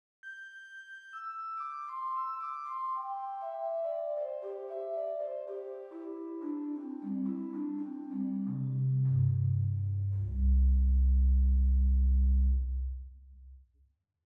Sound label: Recit Cor de Nuit, hauptwerk